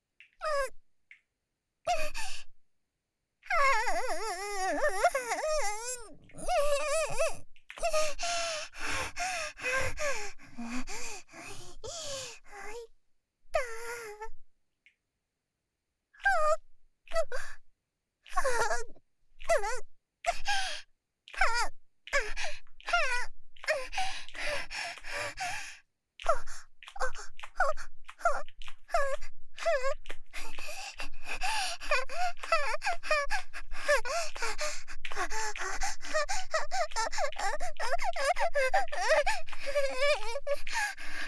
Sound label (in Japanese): 喘ぎ（SEあり）